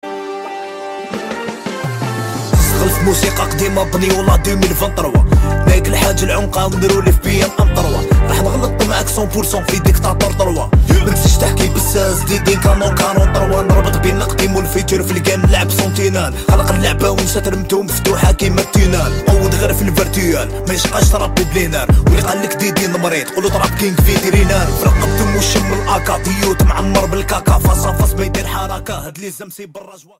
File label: Trap